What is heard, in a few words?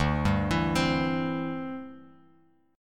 D 9th Suspended 4th D down Bass Standard; arpeggiated; JGuitar